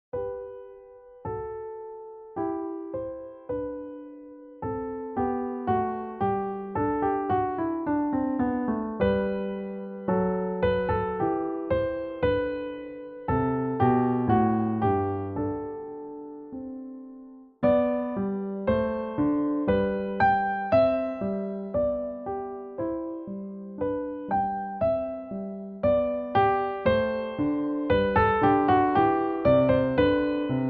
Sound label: gavotte; felton william; old english air felton gavotte farewell manchester